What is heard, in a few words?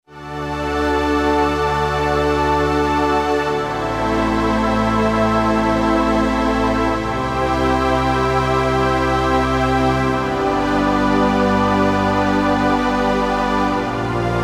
P5+SOLENA, Akai SG01v